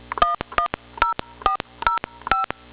dtmf M7

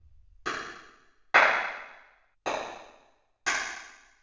clapping reverb; dereverb

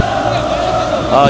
foot p24 crowd

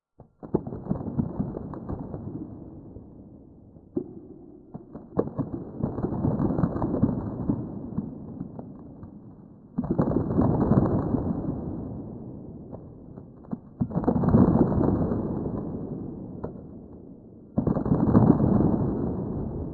applause

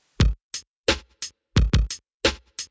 drums clean; dereverb

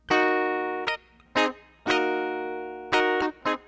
guitar

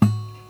excite plucked

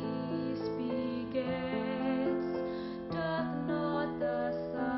sing

